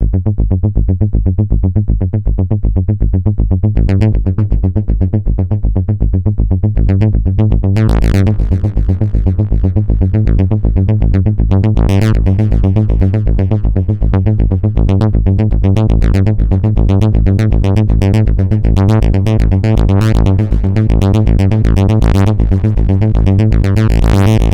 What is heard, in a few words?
oberheim matrix-6r sounds, arpeggiated external fx; Delay by Logic; CEM3396WB